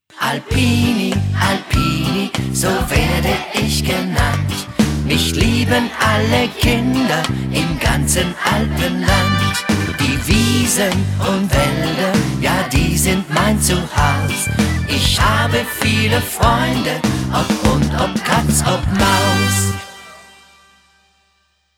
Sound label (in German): alpini song klingelton ohne sanger